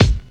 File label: snappy steel kick drum sample a key; Duration 0:01; Channels Mono; kick drum samples